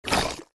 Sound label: Ink Spit; Fancy Pants World 1 Remix